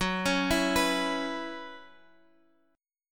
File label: B Suspended 2nd Suspended 4th